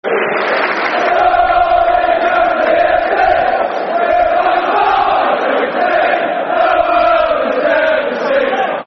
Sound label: Football Chants; Derby County Fc; Derby County Dcfc